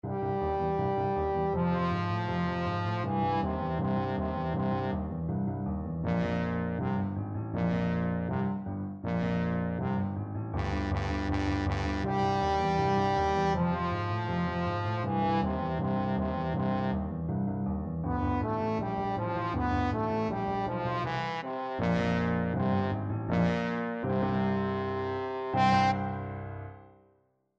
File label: trombone